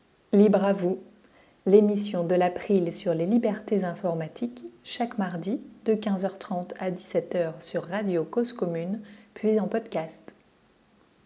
jingle

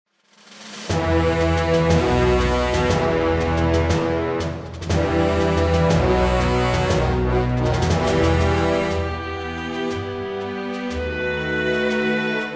main menu, music; wesnoth

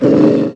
wolf big bite miss; wesnoth

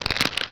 wose hit; wesnoth

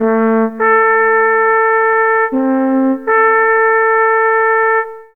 horn, horn signals; wesnoth

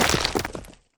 skeleton die; wesnoth

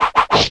hatchet; wesnoth

